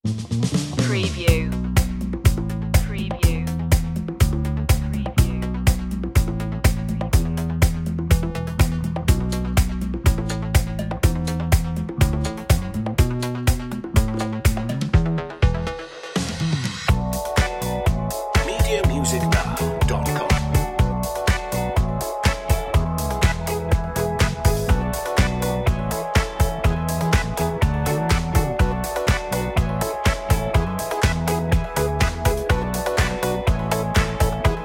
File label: A carefree electro pop track, with cool electric guitars, warm funky bass